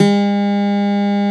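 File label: Steel Acoustic Guitar A3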